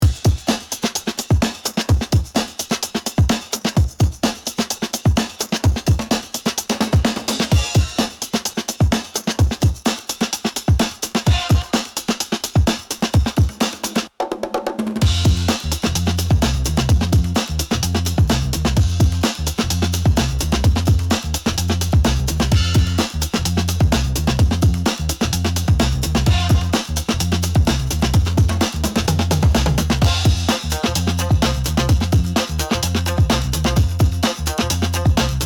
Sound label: Urban beat